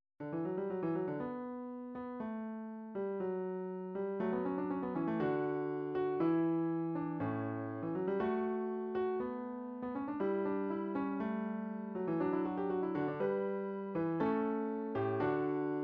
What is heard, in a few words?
classical fugue, midi